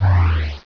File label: Pulse Acid; Grid Warrior™ Demo